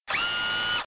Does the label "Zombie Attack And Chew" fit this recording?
No